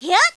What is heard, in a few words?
Heroine Attack